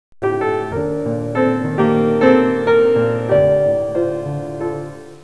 suonerie classica; musica classical

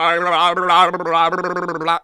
suonerie persone urlare

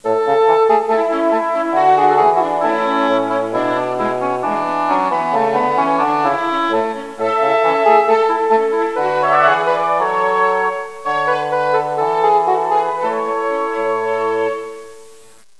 suonerie classica; musica classical

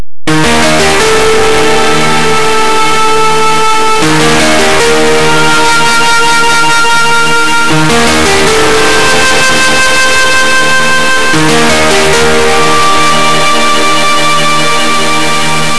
musica newage

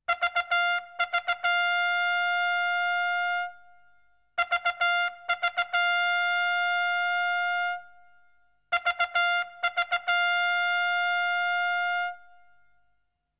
suonerie tromba militare

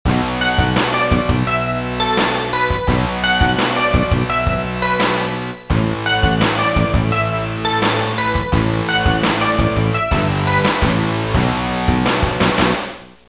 suonerie musica rock

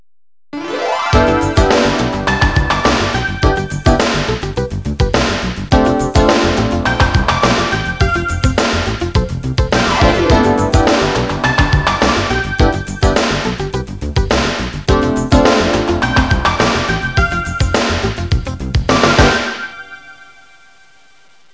suonerie businessy

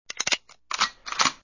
armi

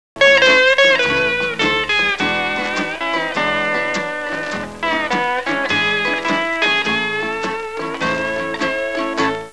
musica strumentale

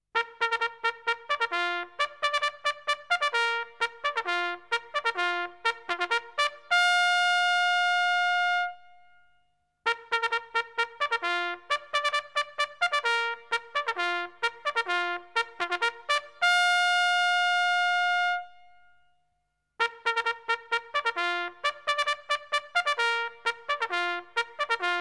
suonerie tromba militare

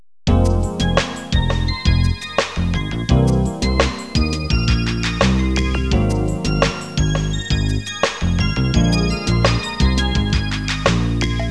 suonerie businessy

